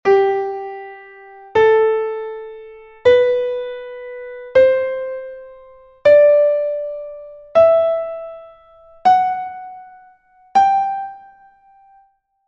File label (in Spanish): escala de SOL; major and minor scales and chords